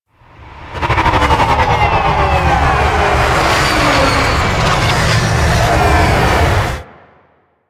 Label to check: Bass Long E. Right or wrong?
Wrong